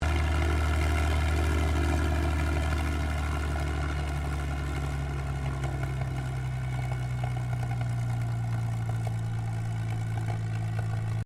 AIRCRAFT CAC CA-18 MUSTANG MK23 OPERATING DECCELERATING STEREO; CA-18 /P-51 Mustang; Airplanes; Sound Effects:Vehicles